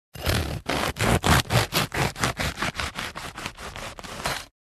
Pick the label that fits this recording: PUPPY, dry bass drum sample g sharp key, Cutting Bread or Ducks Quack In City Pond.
Cutting Bread